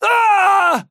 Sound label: pain big; Trapper